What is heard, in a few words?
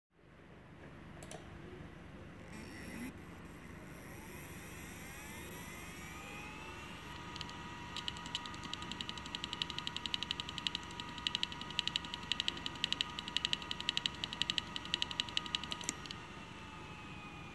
ecc seagate 500, damaged hard drive sounds